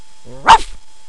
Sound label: dog bark